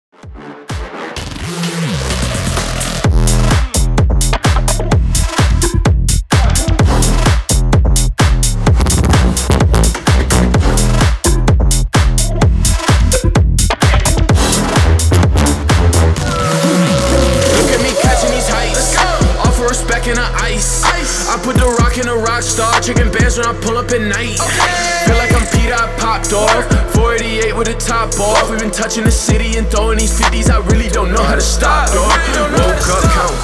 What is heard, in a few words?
128 BPM